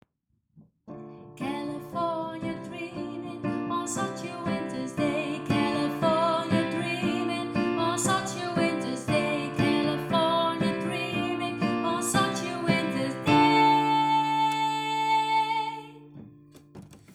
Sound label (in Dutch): Sopr 1 laag